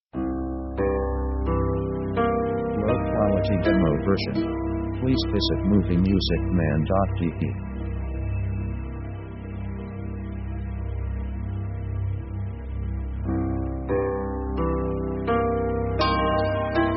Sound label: waiting interlude; contemplative themes